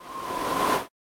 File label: kissing hand; Samsung Galaxy S10; AvatarEmojiSticker Beyond